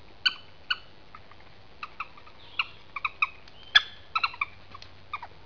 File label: squeak